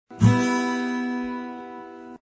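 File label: acoustic instrumental track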